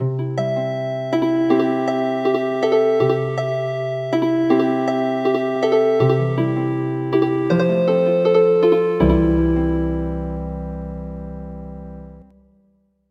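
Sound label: Healing Harp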